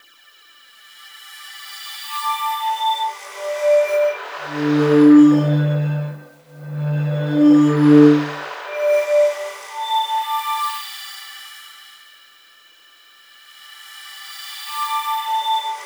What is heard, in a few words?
CRYSTAL WAV; Spectrasonic Distorted Reality 1; CRYSTALWAVES